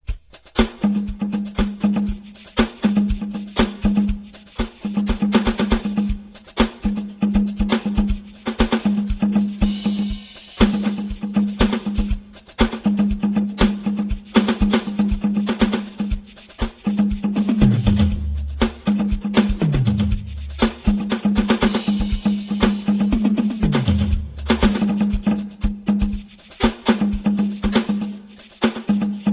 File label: rhythm